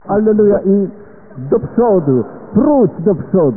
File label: sound 3906 Hz 8 bit